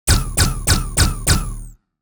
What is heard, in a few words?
Laser; Minora-Game; Sound-Artlist